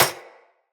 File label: Bullet Impact Generic Metal; Weapons; Surface